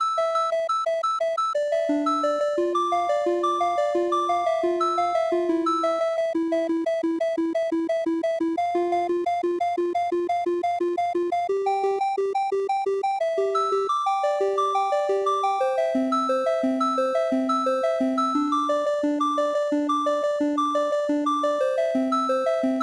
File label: Synth Thingy